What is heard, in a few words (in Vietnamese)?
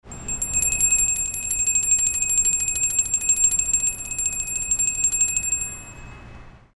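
Tiếng chuông xe đạp reng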